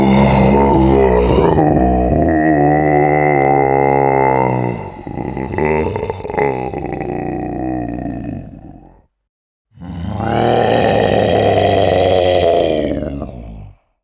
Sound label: Voices Mob walk; guns n zombies 2014 pc; Rygot